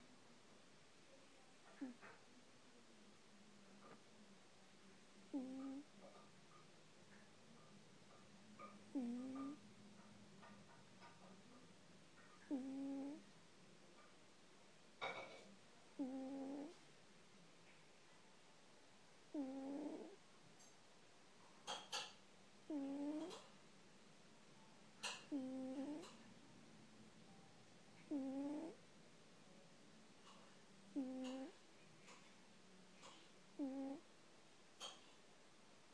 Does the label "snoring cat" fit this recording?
Yes